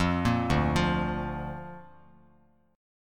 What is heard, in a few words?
D Minor Sharp 5th D down Bass Standard; Dm#5 arpeggiated; JGuitar